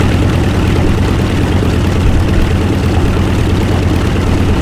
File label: b25 rear, engines; War Thunder